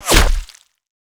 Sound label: zombie pain; basebuilder